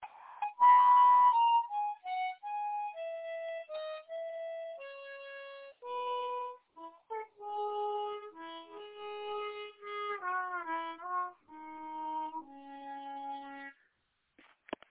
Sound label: desc min melody harp